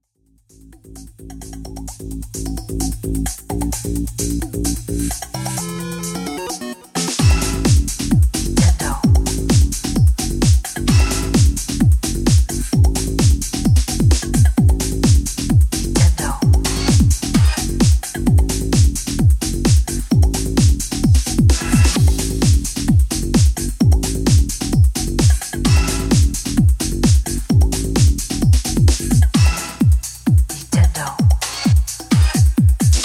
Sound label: Bassline Dub